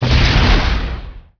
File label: ORNITHOPTER ROCKET; Emperor - Battle for Dune